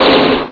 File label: Mine Exploded; Mega Minesweeper; Escape Velocity